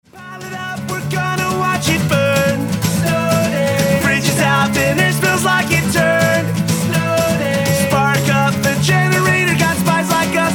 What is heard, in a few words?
Snow Day Acoustic